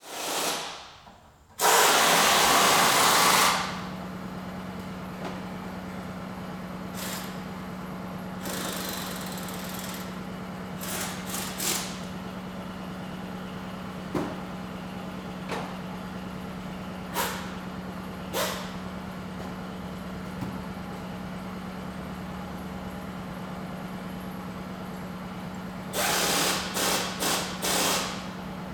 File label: Ambience Transmission Shop; AdobeCC